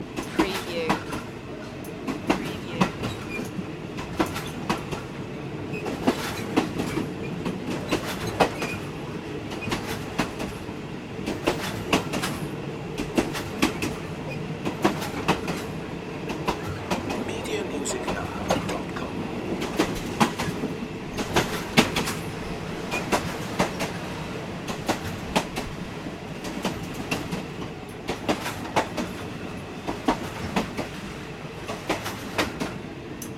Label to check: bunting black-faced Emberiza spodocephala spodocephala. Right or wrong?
Wrong